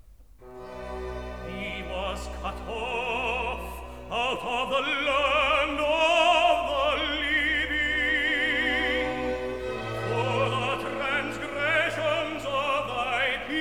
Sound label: Recit, Tenor; He was cut off out of the land; Messiah